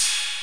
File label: cymbal; 808cymbal; Dominator